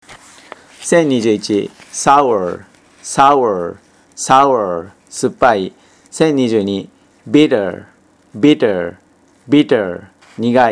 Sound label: tango